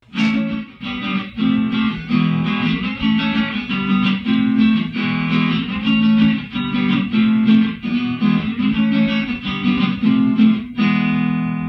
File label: funk